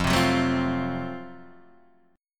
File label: F sharp Minor Major 7th Flat 5th F